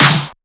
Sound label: SnareClap; FLPRO